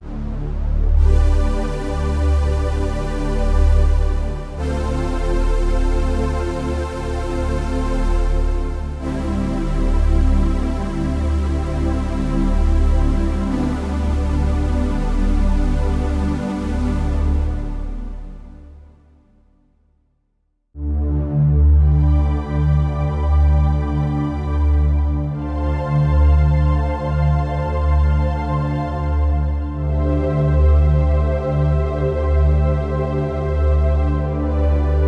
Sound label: synth pads, yamaha tyros3